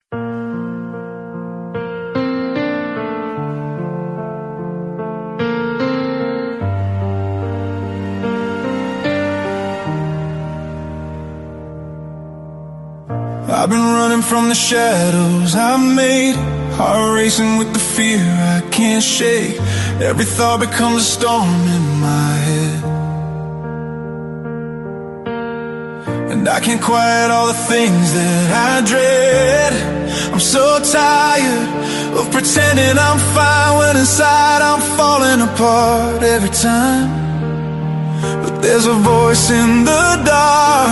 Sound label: Ballad Romantic